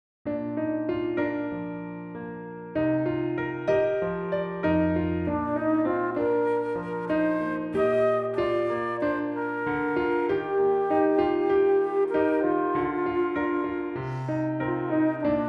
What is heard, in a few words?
flute transposition demo